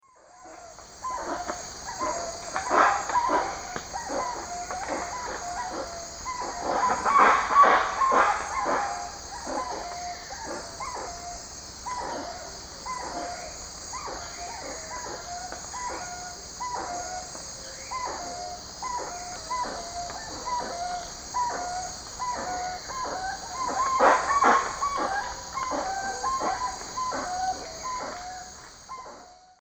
Penelope jacquacu, song and calls; S. America; Eastern Lowlands

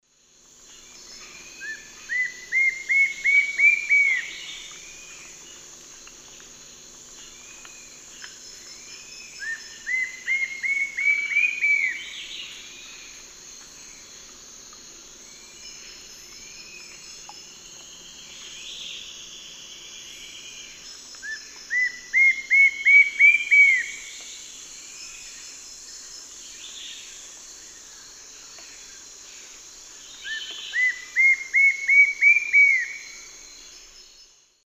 Attila citriniventris, song; S. America; Eastern Lowlands

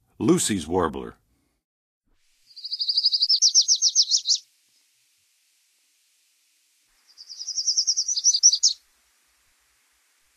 Lucys Warbler, Songs of Birds of North America; Western USA; Western USA Songbirds

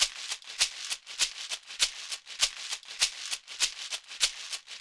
LATIN PERCUSSION

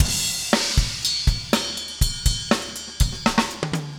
funk 120 bpm, loops

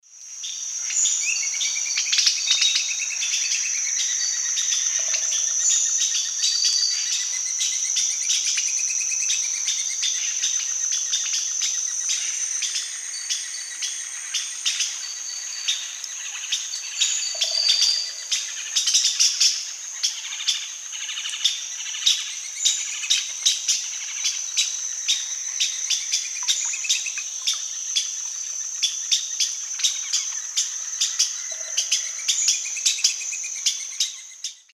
Sclateria naevia, group calls and alarm calls; S. America; Eastern Lowlands

